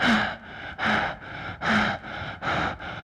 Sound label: Female Breath